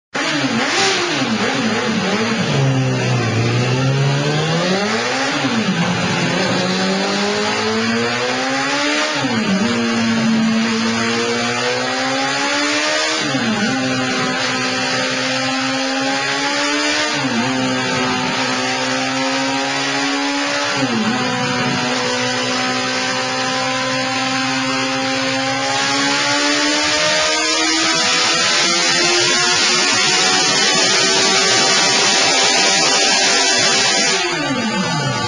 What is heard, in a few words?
SUZUKI GSF 650 BANDIT; 05-06